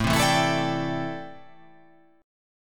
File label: A Minor 11th A, Chord